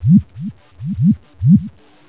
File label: bloop; ambience